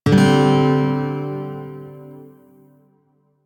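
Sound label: Shawzin Pentatonic Major Chord; Warframe; Lotus Shawzin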